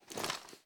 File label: magcloth; tacz; daffas arsenal